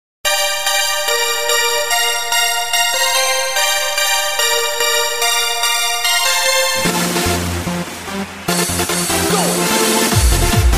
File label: Power Mix